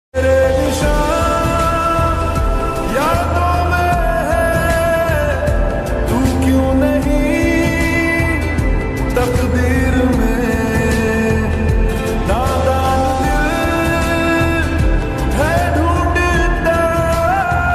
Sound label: lofi ringtone